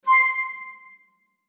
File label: single note piccolo